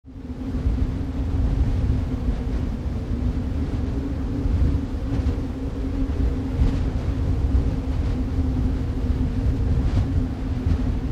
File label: Wind Snow Storm; Weather